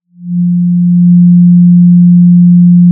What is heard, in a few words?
Sound of a cruise ship horn